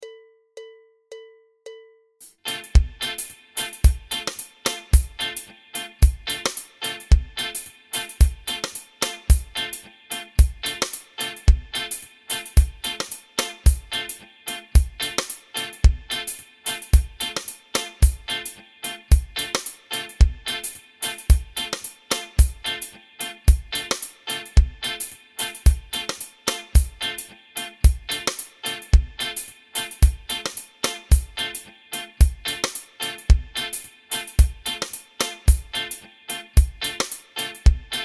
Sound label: impro dm7